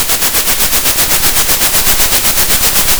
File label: pop, Sound of a train